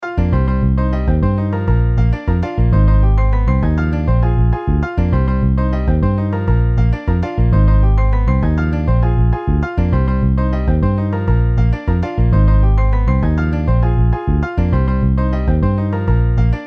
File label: Piano Bass